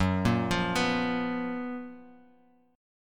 Gb Major 11th

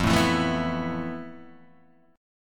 F Major 7th Flat 5th, guitar chord

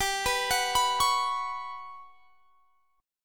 G Major 7th Flat 5th; Listen to GM7b5 arpeggiated; GM7b5 Chord

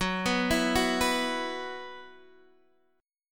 E Suspended 2nd F sharp

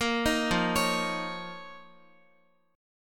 F 7th Suspended 4th Sharp 5th, Guitar; F7sus4#5; x,x,8,8,5,8; 4E,4A,5D,5G,5C,6F; arpeggiated

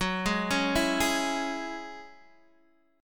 Gb 7th Suspended 2nd Gb, Guitar Open D; chordshape; down

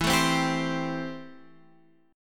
A-Minor 7th E

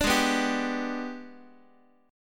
G sharp Minor Major 7th Flat 5th G sharp, Guitar; strummed; JGuitar

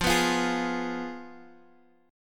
F Diminished, Guitar, Half Step Down

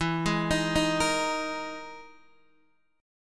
E Major 7th Flat 5th E down Guitar Open D

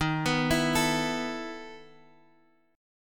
E Major 7th Suspended 4th Eb

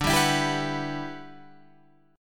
E Minor 9th D, down, Guitar, Standard

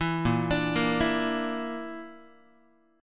A sharp Major Flat 5th down Guitar; arpeggiated

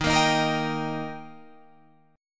D sharp Major D sharp Guitar Drop D, chord

